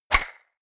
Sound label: golf ball hit